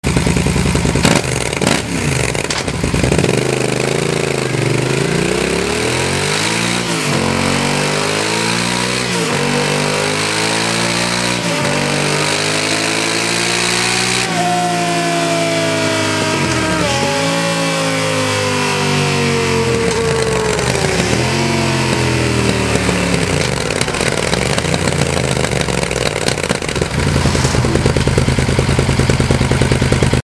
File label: YAMAHA XT 1200Z SUPERTENERE; 2010